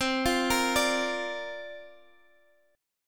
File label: D Minor 7th Sharp 5th C